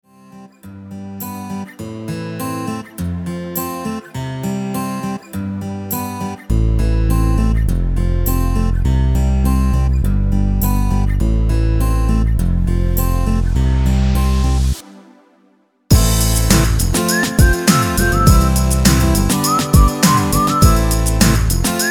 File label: Whistle